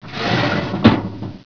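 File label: SLIDE DOOR; homm2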